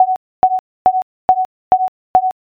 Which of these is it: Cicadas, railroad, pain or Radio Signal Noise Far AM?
railroad